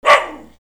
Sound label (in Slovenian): Pes